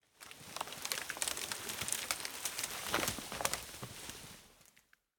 Tree Cut Crack And Fall In Forest Movement Not Thud